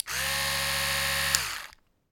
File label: electric toothbrush; Golden Crayon Sounds